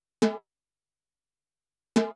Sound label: GATE TOM, Techno 138 BPM; KOELN; Best Service ProSamples vol.54; AKAI